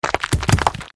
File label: golem stone ded; Clicker Heroes